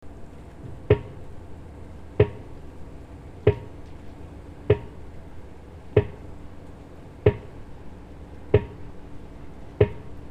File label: Pile Driver